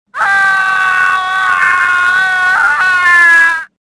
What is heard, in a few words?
falling, worker; worker3